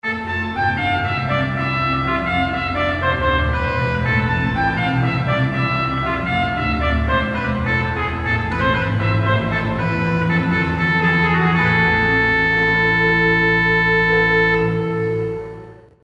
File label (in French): Trompette